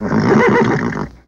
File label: Horse Whinny Neigh Ni